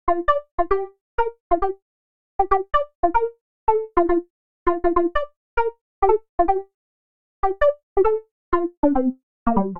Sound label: Pluck G Minor 88bpm; MIDI Clips; Melodic; Please Pizza; Ableton; Factory Packs; Chop and Swing